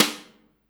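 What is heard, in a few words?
snare drum, 180 BPM; Ueberschall; Drum & Bass Resonance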